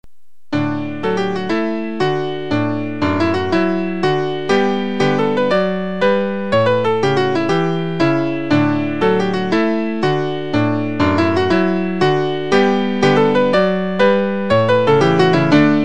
Gavotte in D

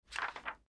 panel popup

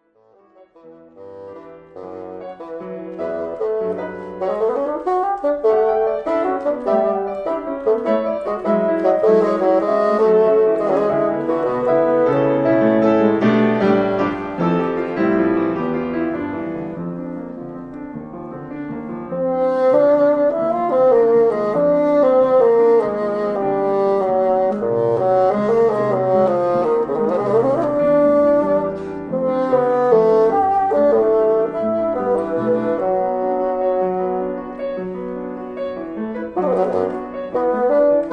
bassoon

